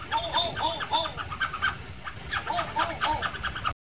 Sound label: hoho